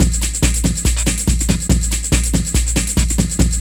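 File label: drum loop; X-Static Goldmine 3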